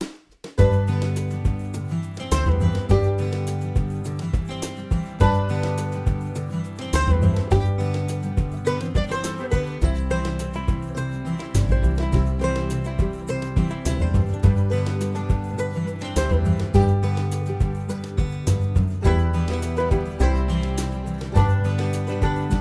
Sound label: Radio Music; Jurassic World Evolution 2; PS4, PS5, Windows, Xbox One, Xbox Series X/S